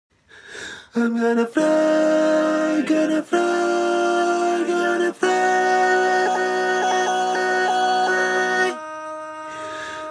tenor

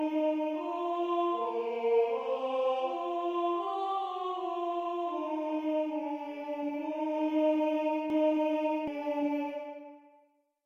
Lead, barbershop

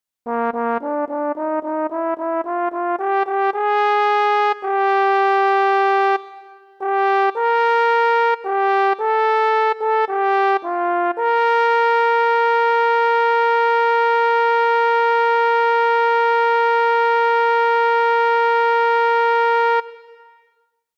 Tenor

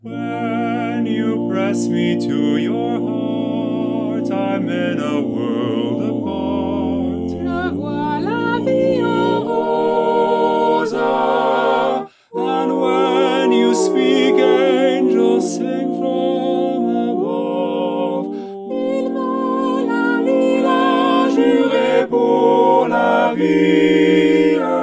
French and English All Parts, barbershop

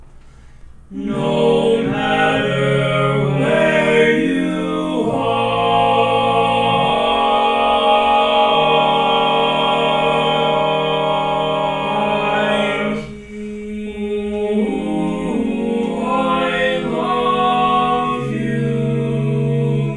All Parts, Barbershop Tags

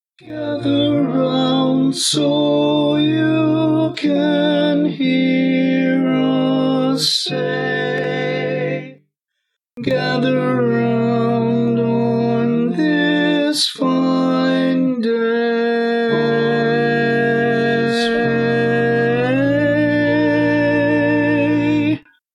Lead, barbershop tag

